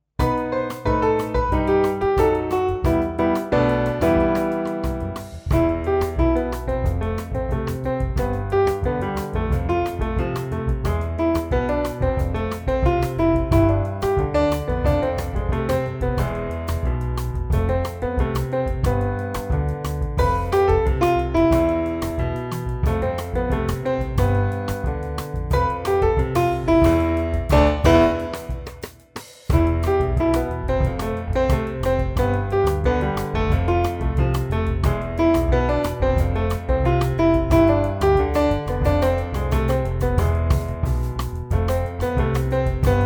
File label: Syncopation, piano; Jazz